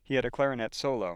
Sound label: clarinet Solo